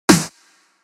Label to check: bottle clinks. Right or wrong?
Wrong